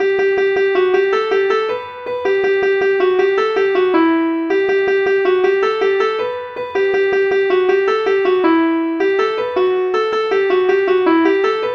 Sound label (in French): derobee guingamp